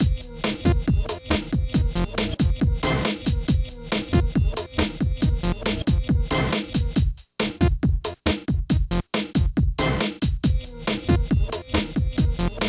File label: Get Your Groove on, 138 Fmin, Hardcore Breakbeat; Big Fish Audio; Hardcore Breakbeat Action